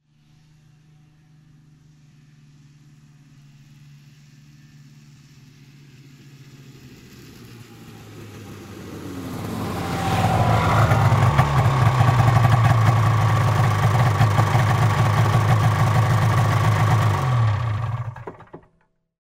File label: Snowmobile is approaching, waiting, muted